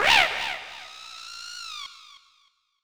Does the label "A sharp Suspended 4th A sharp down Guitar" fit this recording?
No